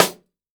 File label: jungle snare chromatic, real drums